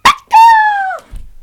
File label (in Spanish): lanzar Pelota; mod chipmunk